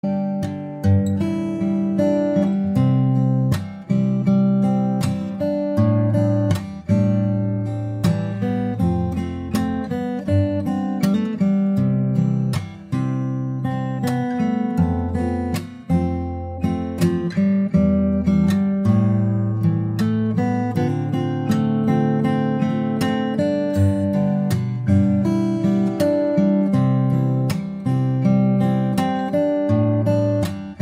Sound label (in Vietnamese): nhac chuong guitar cover khong loi